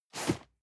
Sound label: cardboard box medium slide short hard; objekte